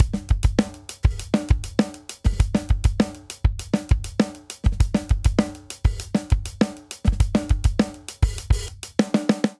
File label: Linear Funk Verse Variant 100 bpm; 4 4; MIDI Clips; Ableton; Factory Packs; Drum Booth